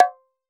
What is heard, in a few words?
Tabla Tang; Roland BK-5; Percussion